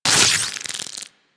Web Detach; Warframe; Corpus; Arachnoid Micro; Abilities

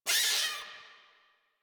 Forest Rodent Vocal Pain; Characters; Warframe

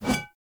One Handed Axe Whoosh

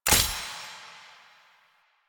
Color Select, UI; Warframe; New Player Intro

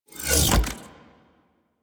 Big Slash Unequip; Crp Big Slash

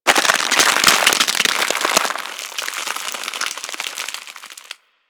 Freeze Ray Frozen; Warframe; Weapons; Corpus

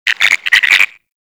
Garden Animal

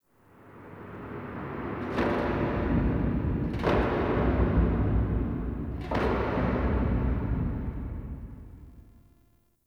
Warrior Echo, Crypt Ambience; One Offs; Champion Crypt

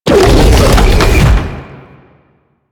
Biped Windup; Warframe; Man In The Wall

